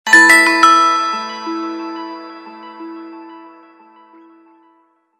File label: bells, Motorola; A3100